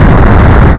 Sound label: big explosion; sfx; cosmic protector